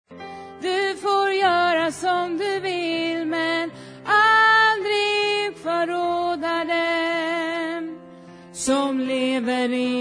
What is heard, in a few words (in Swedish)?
sopran, stammor